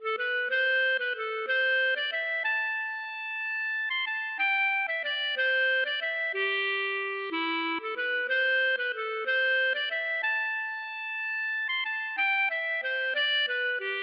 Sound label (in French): valse Ecossaise